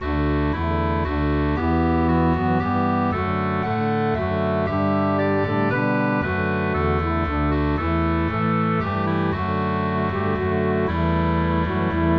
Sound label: Melody Bass Harmony